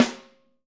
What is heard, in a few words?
WOOD, Living Drums; Sampleheads; Peter Erskine Living Drums